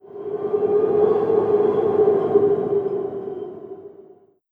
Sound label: Amb Ice Intro